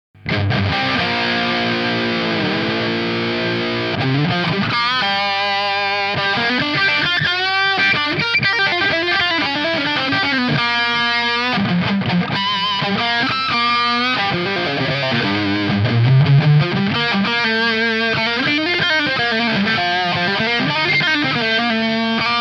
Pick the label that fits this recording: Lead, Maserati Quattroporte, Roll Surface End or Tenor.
Lead